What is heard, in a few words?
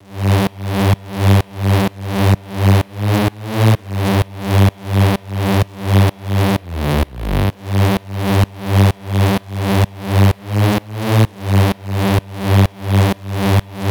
Progressive Kit Melody; Mutekki Media; Vengeance Electroshock Vol.2